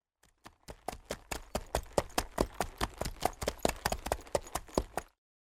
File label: Horse; cinematics; Age of Empires III - Complete Collection; Microsoft Studios